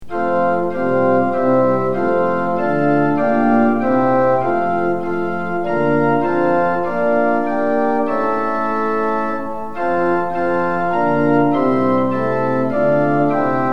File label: tune; hymns; lillenas